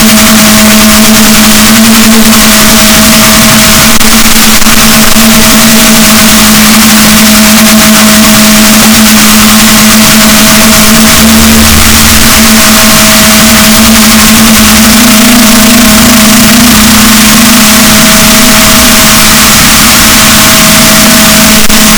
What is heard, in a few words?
rorquals, Balaenoptera Physalus, boat; rorquals positif; Chile